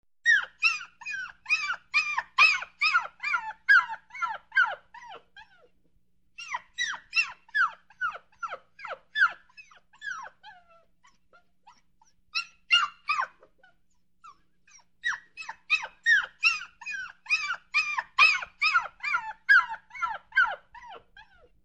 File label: Small dog whining